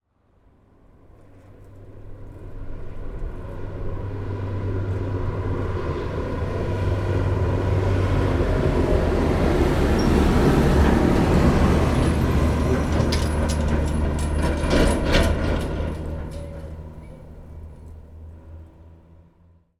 transport tractor towing large metal trailer pass on road